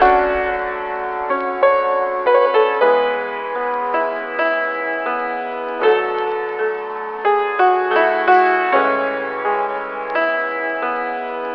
piano piddling, Loops; Phonky

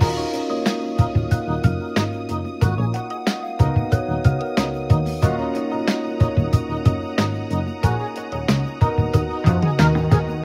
full keys, Loops Phonky

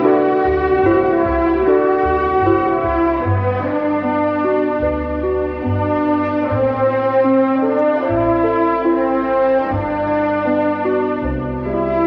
strings bittersweet, Sad; loops

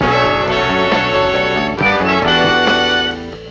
horns, phonky loops

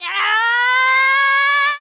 "ah" martial arts buildup, Voice; ooh ahh

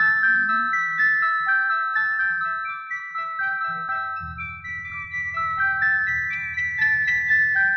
keys

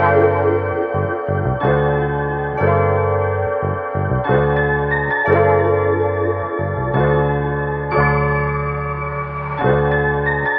keys, phonky loops

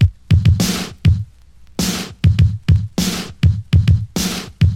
Drum loops, Electro

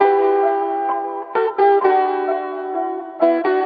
guitar and piano, Sad

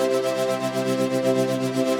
padline, synths; Notes

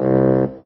sax; Winds

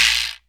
guiro

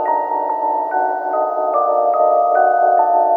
keys, Sad Loops

